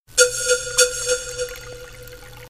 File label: Nice Msg